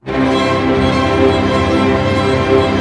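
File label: strings arpeggio major, symphony orchestra; Roland LCDP08; HIT Major Hits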